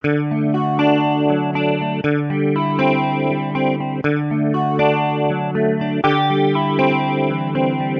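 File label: Guitar Retro D major 120bpm; Guitar and Bass; Ableton; Factory Packs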